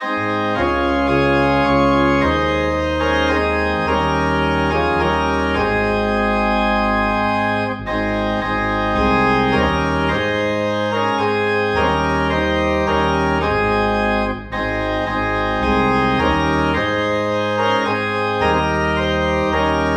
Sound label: Organ in A Flat; Whiter Than Snow